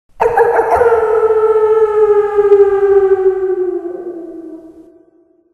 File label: Howl, ringtones; Microsoft; Windows Mobile ringtones; Ring Tone Pack 6